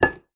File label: food apple slice drop on plate